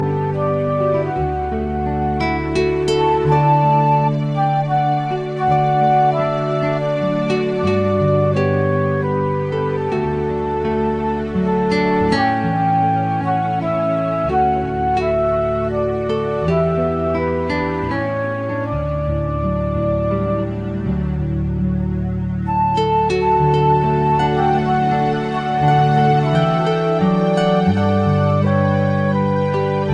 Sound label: Instrumental Away In a Manger